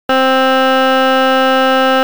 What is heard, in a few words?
violin, tremolo